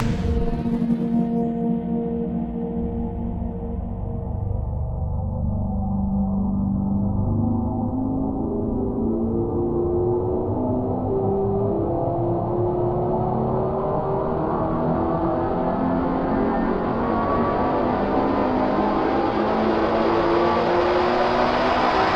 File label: Start Attack; Game rip; MiSide